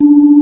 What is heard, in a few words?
symbol chat; Earth and Beyond; EA Games